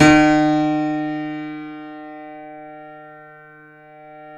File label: C7 FF, The Ultimate Piano Collection; East West